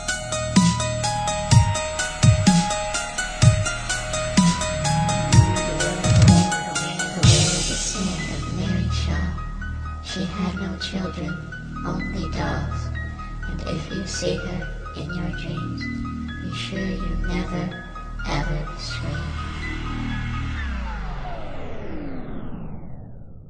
dubstep

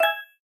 Google Glass Message

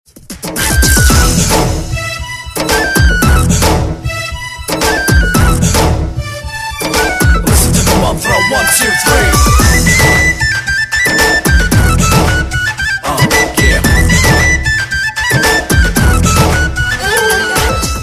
superb flute; country

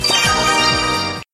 Envelope Jingle

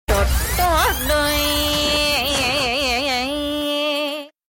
very funny tone

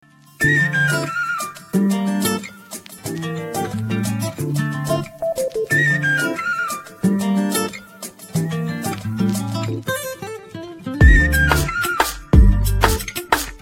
arabic flute; classical

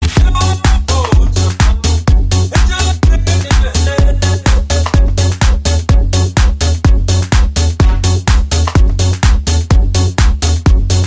gospel, electronic ringtones

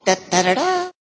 Is Calling Pop

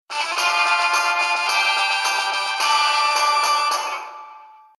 alert

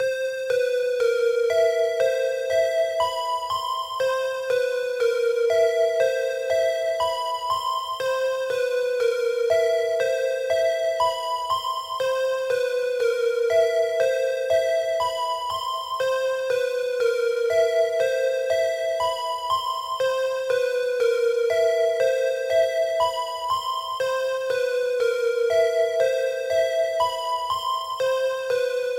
Vibra Bell Ringtone

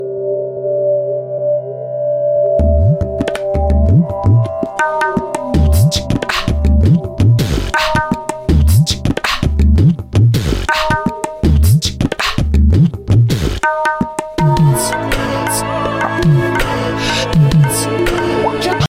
beat box

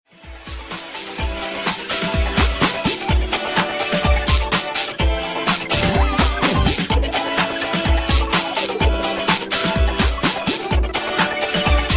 ringtone anime ost; ringtones

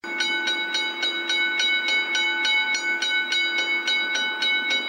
Train crossing

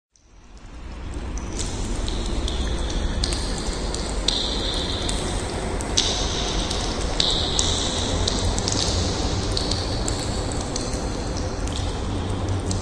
Water Dripping

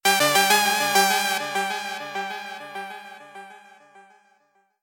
Electro synth hook

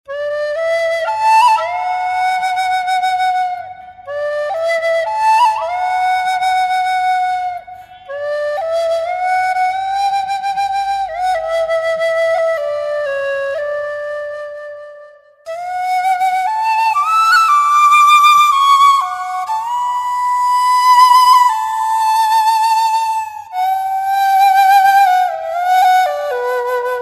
pleasant flute

